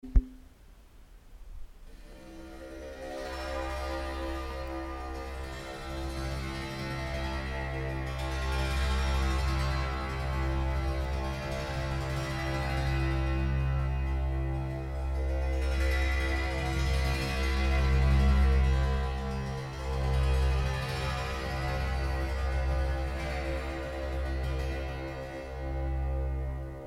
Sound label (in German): Klangprobe Monohord